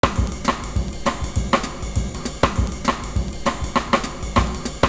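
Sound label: SHUFFLE, 100 bpm; Univers Sons - Kitch 70s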